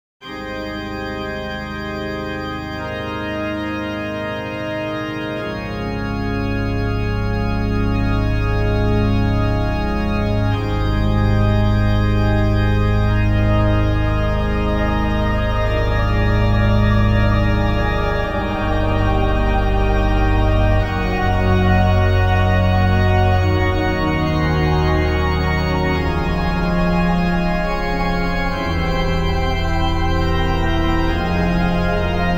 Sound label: Organ Music